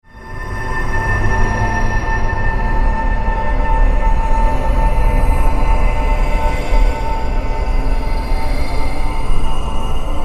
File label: Synth, Stab, Drone, Horror, 5.1